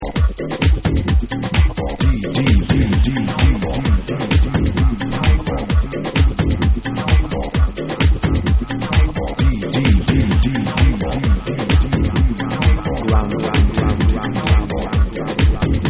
DRUM, Grounded Deep Mix

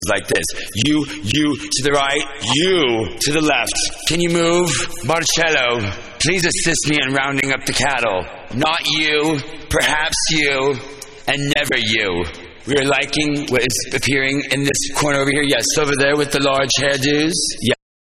Acapella

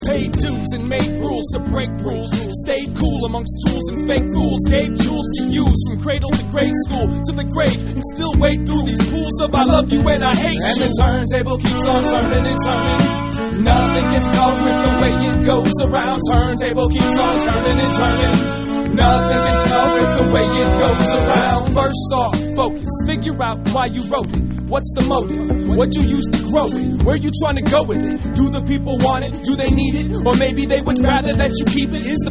Vocal

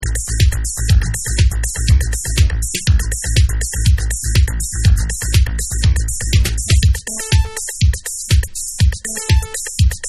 Acid Instrumental

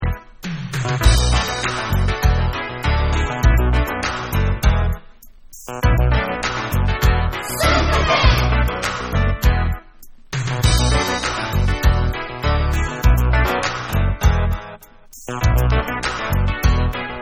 Extended Instrumental

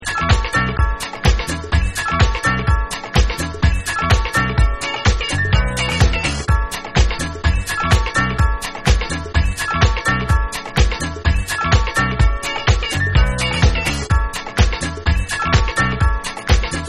DISCO DANCE

